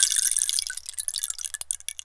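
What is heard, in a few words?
RAIN AMBIENCE, RAINSTICK; AKAI S6000; WorldSounds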